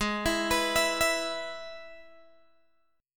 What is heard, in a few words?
Ab Minor Sharp 5th, Guitar, DADGAD